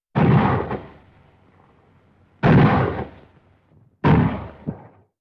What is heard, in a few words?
Heavy Artillery Shots